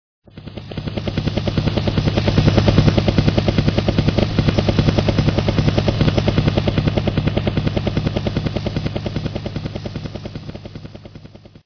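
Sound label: Helicopter; vehicles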